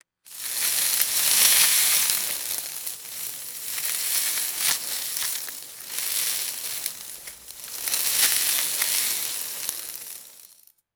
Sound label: dry cornstalks and foliage rustling; vegetation